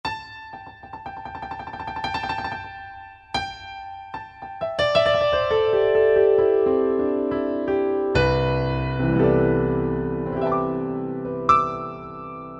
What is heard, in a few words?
Grand Piano, Yamaha PSR-3000; Factory Voices